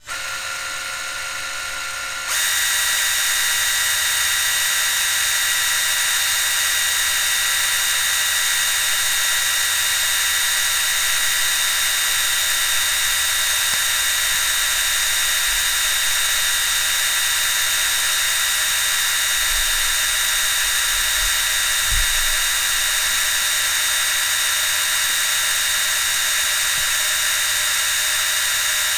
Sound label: Technology Electronic Video Camera Fast Forward Tape